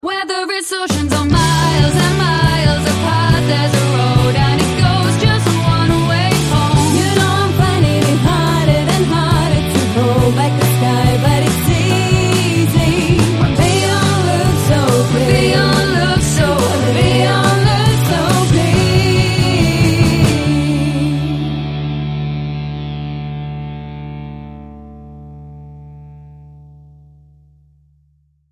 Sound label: Vocal Chorus; デリ ミュージック